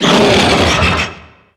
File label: roar; carrion queen